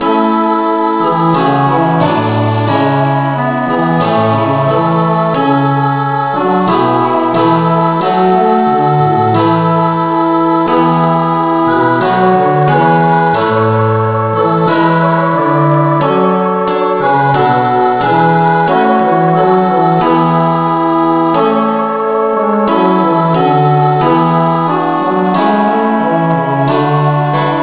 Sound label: christe Sanctorum rev no vocal key a, A Major